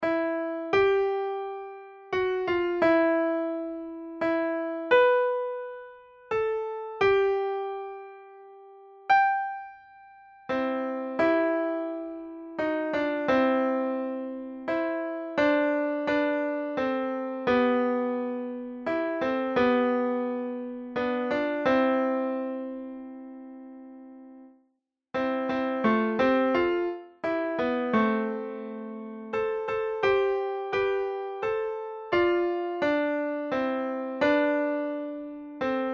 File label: Bass, midi; 聖母經 黃友棣